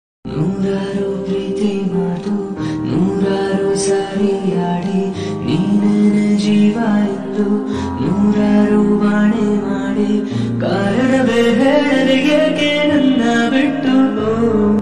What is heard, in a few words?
kannada feeling ringtone sad feeling song love feeling